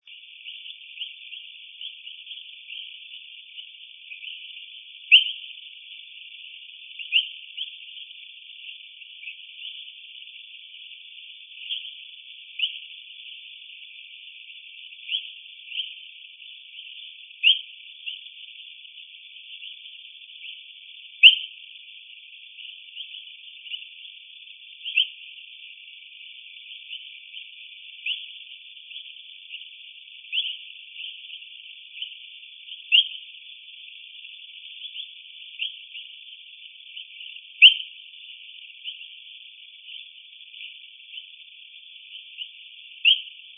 Eleutherodactylus pipilans; eleutherodactylidae

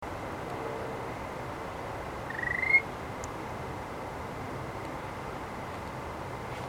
eleutherodactylus grandis; eleutherodactylidae

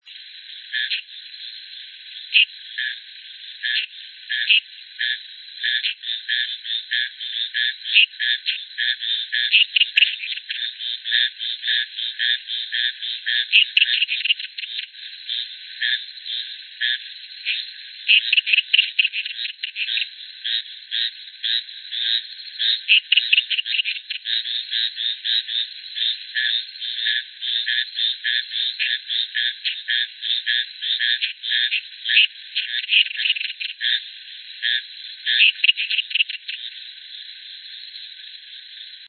Scinax stauferi, hylidae

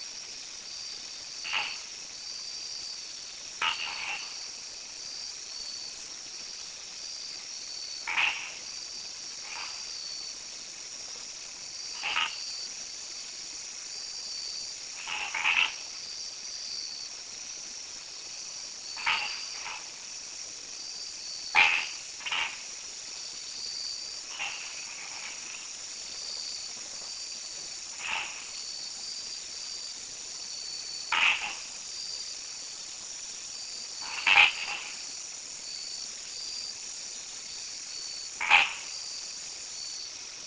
Tlalocohyla loquax, hylidae

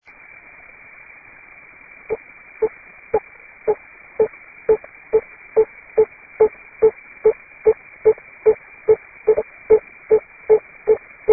Anotheca spinosa; hylidae

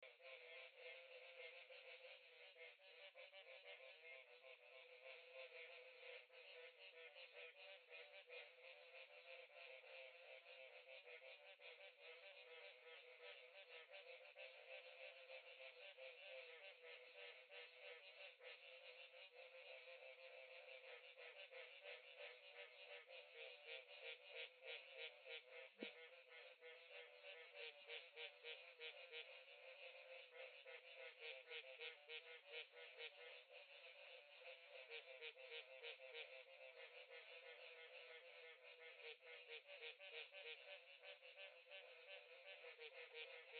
Smilisca baudini, hylidae

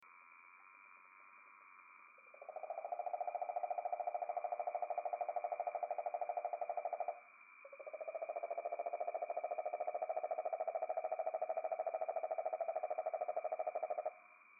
Rhinella horribilis, bufonidae

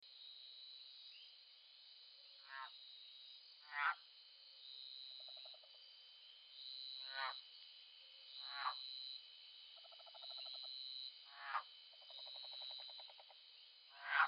Agalychnis dacnicolor, phyllomedusidae

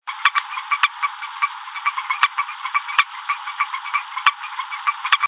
Leptodactylus melanonotus, leptodactylidae

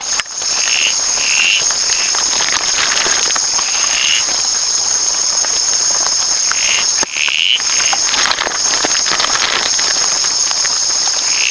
Ptycohyla macrotympanum; hylidae

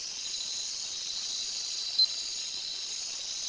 Craugastor, craugastoridae; craugastor sp1

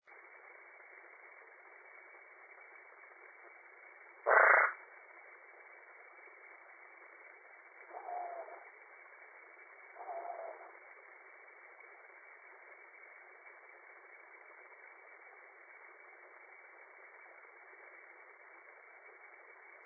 Smilisca cyanostica; hylidae

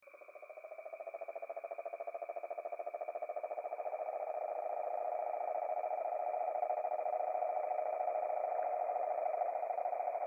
Rhinella horribilis, bufonidae

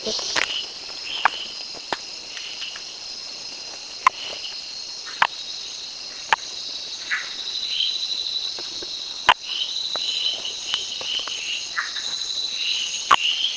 Agalychnis callydrias estres; phyllomedusidae; Agalychnis callidryas

